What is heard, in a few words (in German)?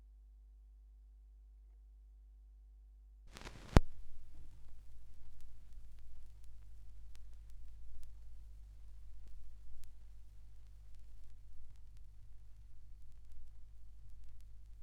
schallplattengeraeusche